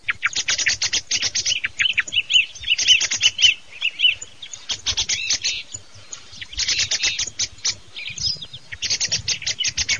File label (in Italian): SCHIAMAZZO